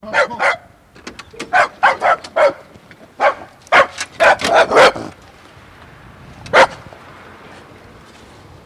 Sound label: dog bark at post through mail box; sound effects one